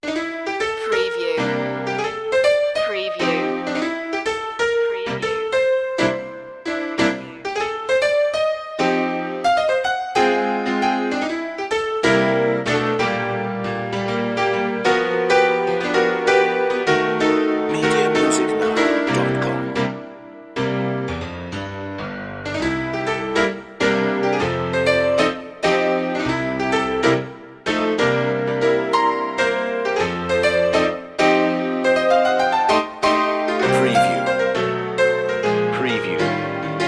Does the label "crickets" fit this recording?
No